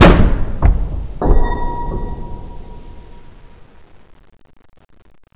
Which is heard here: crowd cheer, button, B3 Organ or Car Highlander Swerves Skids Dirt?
button